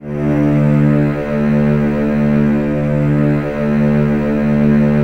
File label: Virtuoso Strings, R; ILIO; Kirk Hunter Virtuoso Strings VOL-2; 90 s Sample CDs